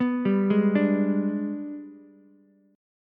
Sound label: Minor Major 7th Flat 5th, Ukulele; G#mM7b5 arpeggiated; 4,7,4,5